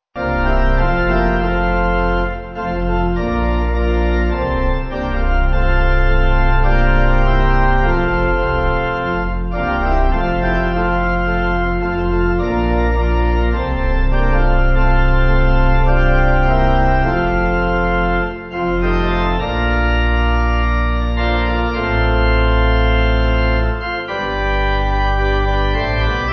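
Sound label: Pipe Organ, Music Backing Files for Churches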